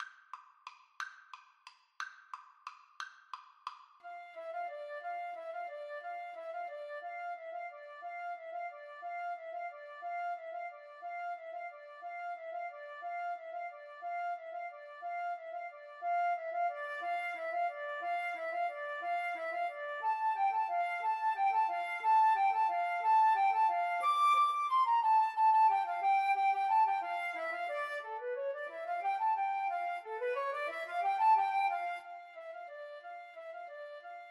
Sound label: flute duet